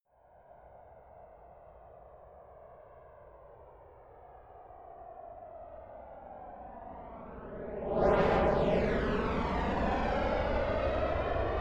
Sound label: military jet f-22 raptor flyby left to right distant